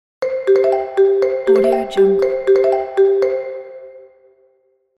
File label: marimba ringtone